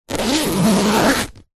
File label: foley suitcase zipper